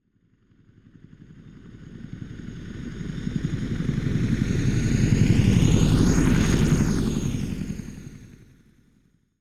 Military helicopter flying overhead aircraft pass by